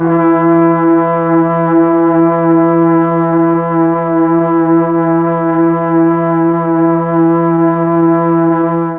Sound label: E3 BRASS, Mellotron; HALFSP.BRASS; Mike Pinder - Mellotron Archive CD